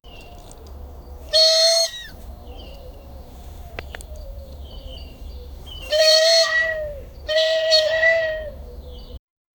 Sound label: elk bugle screeching sound; animal vocalization